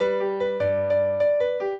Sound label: trio, piano; Mozart